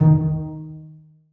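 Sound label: basses pizzicato d#3; Sonatina Symphonic Orchestra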